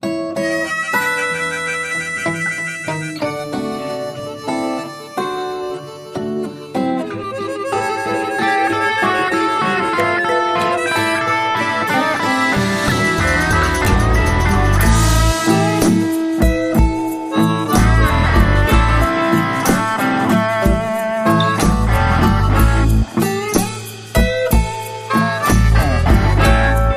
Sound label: Tuesday Night Blues Jam